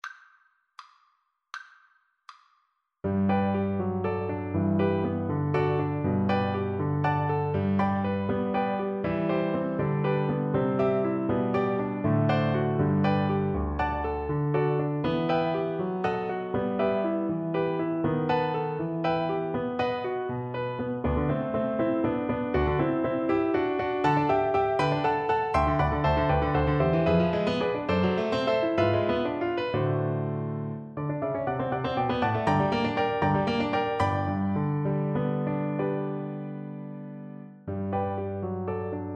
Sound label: lieder, Flute; brahms 5 lieder o liebliche wangen